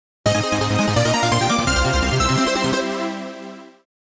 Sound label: Game Clear, GAME CLEAR JINGLE; Arkanoid DS